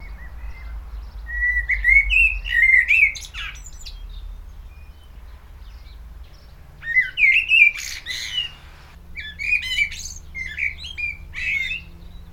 blackbird on board stereo mic with zoom and windshield; normalised